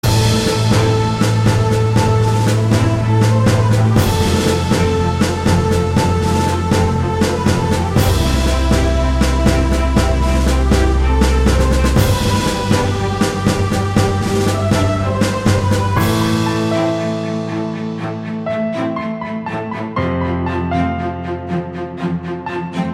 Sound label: Stunning epic grand; MIUI12